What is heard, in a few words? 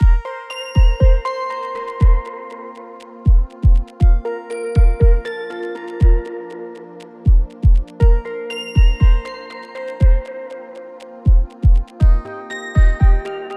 synth delay; timeless 3